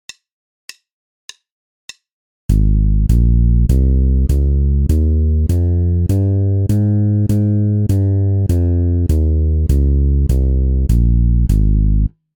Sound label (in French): Gamme majeure de Lab, basse; exercices